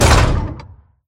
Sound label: move clunk, combine train; mechanical units